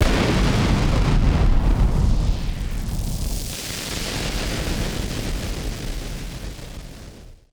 Party Popper FX

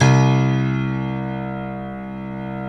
GRAND PIANO; OMI - Universe of Sounds

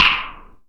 SNAP VERB, FINGER POPS; AKAI S-Series CD-ROM Sound Library VOL-5

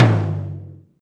tom lo, Alesis DM5

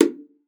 BONGO A1, bongos; Best Service ProSamples vol.42 - Session Instruments

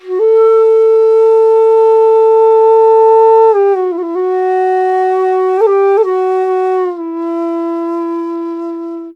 INDIA ALTO

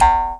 E JAW, Primitive Instruments

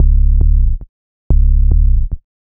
Bass, Cinematic Funk Urban; Sonic Foundry (Sony Creative Software); Cinematic Funk Urban Tone Poems

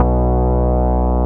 BAS MINI, MOOG; Sound & Vision - Gigapack II CD 1

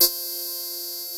WINY LEAD C4, Analog Fantasies; USB Soundscan vol.49; AKAI; YAMAHA

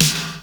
ROBOTS NR, DANCE KIT; Crypton - DrumBase5000; DANCE KIT #3

